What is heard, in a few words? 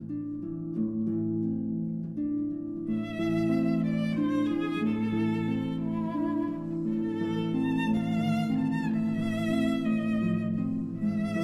Berceuse, for violin and harp